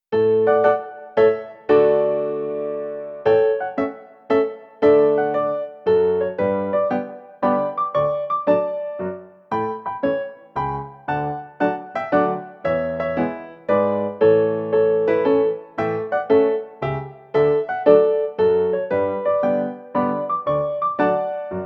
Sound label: Mazurka; Delibes